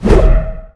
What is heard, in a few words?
tail, dragon